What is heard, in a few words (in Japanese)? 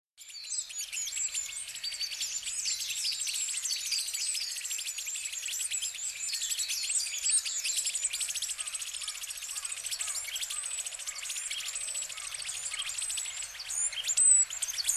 yamagara, hyogo